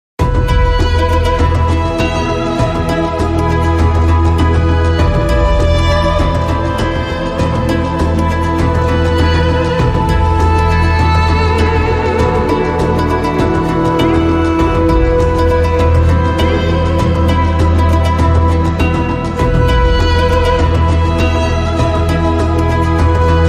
Bulgarian Tone Short

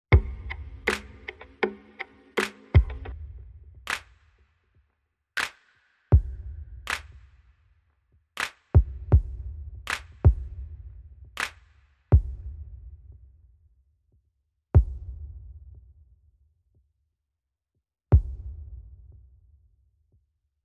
Drums Only

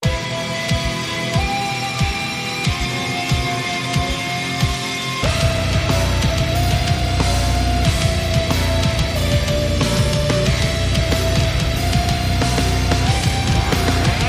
Metal Battle

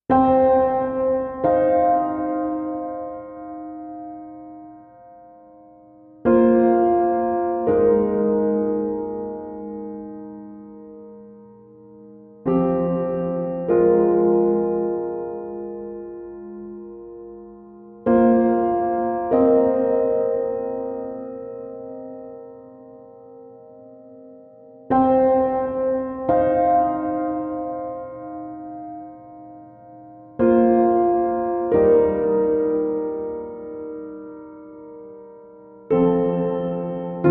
Piano Mix

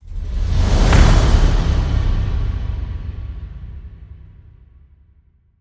Perc Slam

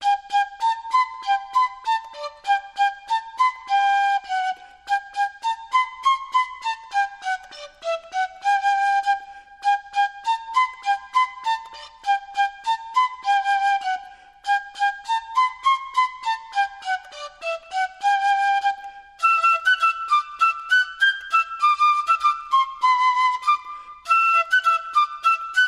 Solo Fife; Yankee Doodle Dandy

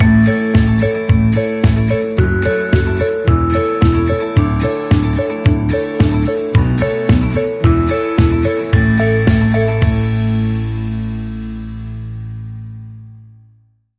Creeping Upwards, Sting Upbeat

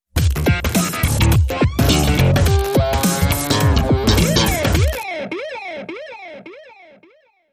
Bass, Sting

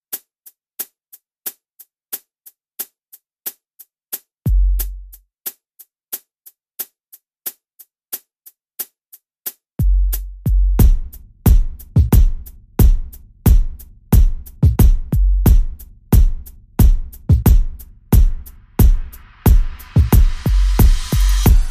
Beat

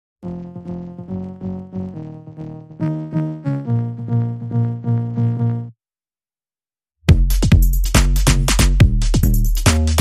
No Percussion Drums and Bass

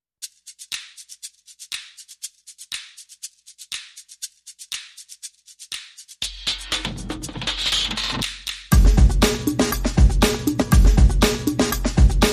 Drums and Perc Only

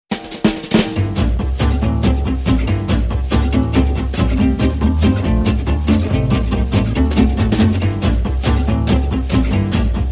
No Piano

